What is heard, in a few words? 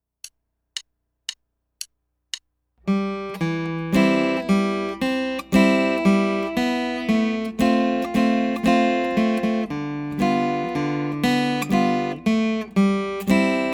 Western Song